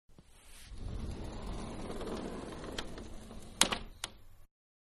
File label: Sliding Door Open; Miscellaneous Doors (Sound Effects:Doors); Manhattan Production Music